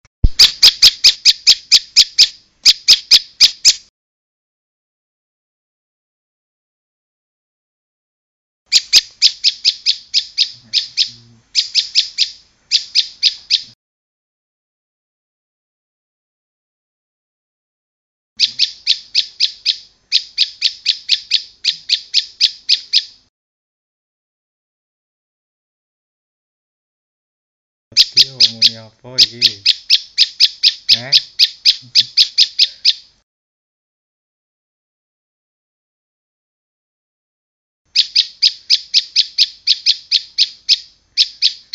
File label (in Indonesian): Suara Burung Prenjak Lumut